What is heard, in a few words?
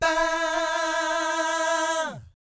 VIB FX, Vocal Planet Jazz & FX; ILIO; JAZZCOMBO